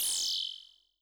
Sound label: got extra time